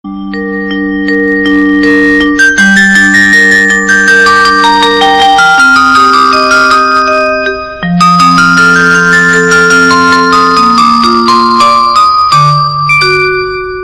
Electronica

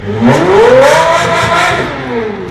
Zonda F Engine

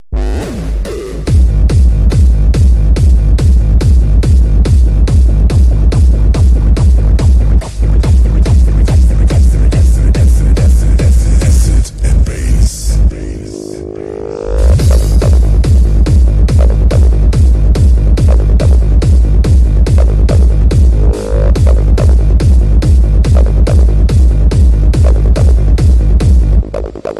Acid Bass

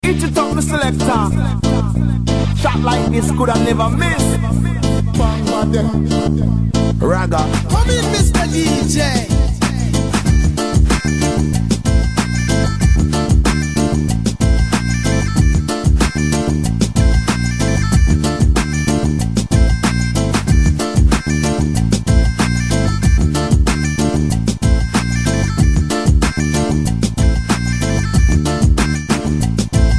Trance

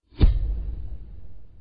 Thud; Ncis

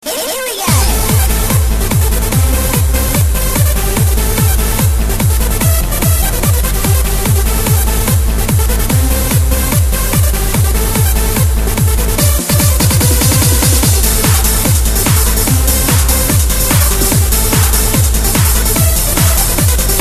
Trance

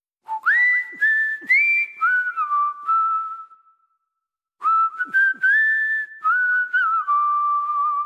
Joyful Whistle

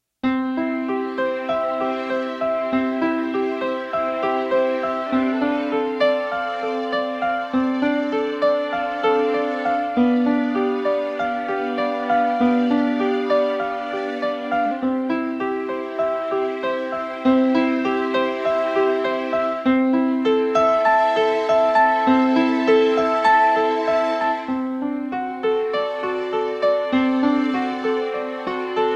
Prelude In C Guitar

